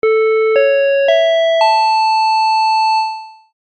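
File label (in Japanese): お知らせ・始まり・遅め; 戏剧音效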